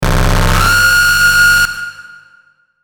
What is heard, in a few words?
Feedback Electrical Short Buzz Ascend, Feedback Metallic Swell Scrape; Feedback Sound Effects